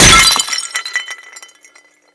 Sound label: Pottery SFX KO; Skylanders Cloud Patrol; Arcade, iOS, Mobile